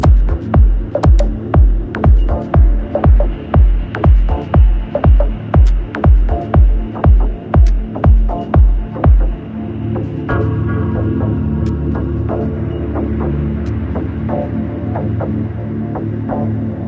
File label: bell birds dub